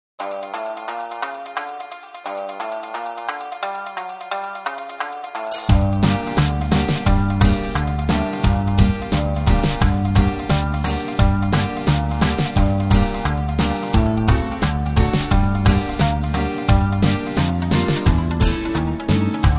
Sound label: brass minus